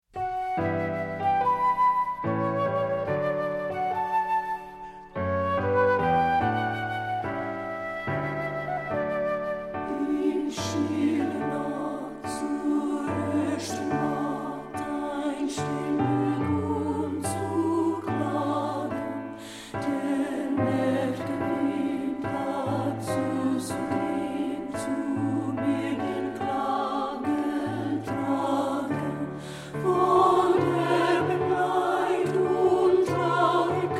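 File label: In Stiller Nacht ALTO, 3 part mixed; partbypart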